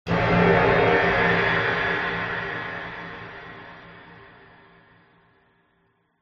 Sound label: cinematic hit shocking reverberant scary; sound design